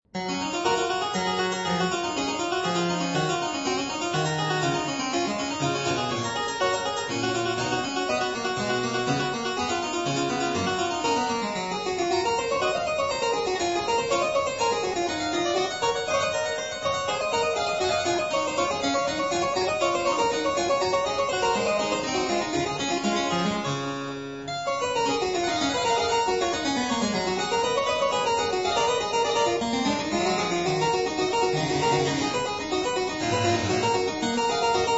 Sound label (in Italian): a 1 ovvero 2 Clav.